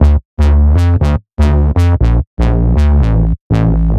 Tech Bass 120 D, techno; musicradar; 120bpm; Bassline

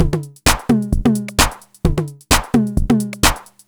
Beat (130BPM), techno; techno drum fx

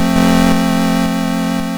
YM Chord Am; ChipShop Deluxe; ChipShop Hits

